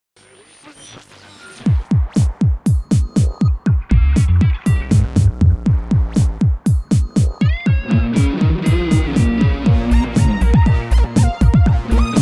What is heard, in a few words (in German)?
Radio Git klirr Old; singles flirt up your life windows gamerip 2004